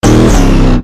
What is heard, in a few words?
Sleepy Voice